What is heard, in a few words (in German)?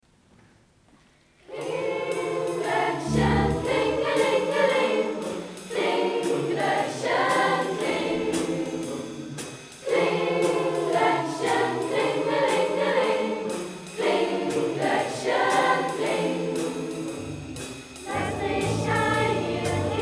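Jazzy kling Glöckchen, Chor Lieder; Musik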